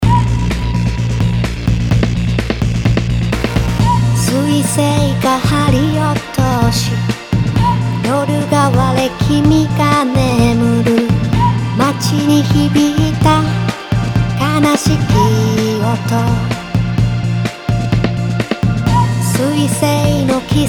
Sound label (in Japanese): Vo check, 夏の日ボーカル サビチェック